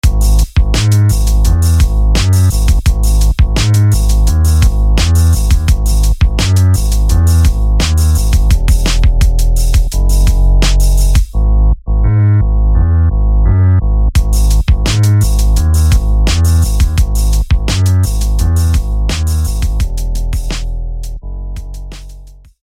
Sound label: Hardstone Bass; SFB FX